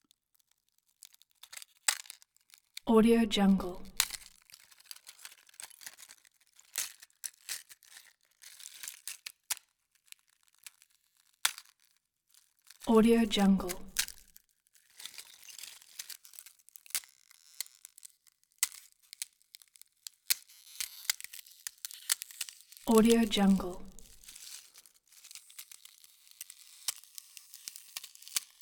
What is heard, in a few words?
ice breaking